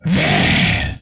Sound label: Inhuman Attack, Vocal; retro arcade